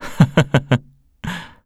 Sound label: laugh; Eternal Return; KOR